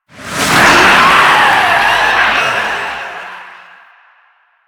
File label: Stadium Swarm Stinger; Infested; Warframe; NineteenNinetyNine